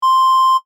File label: censor bleep sound; conkers bad fur day